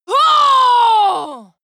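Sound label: female combat attack long, screams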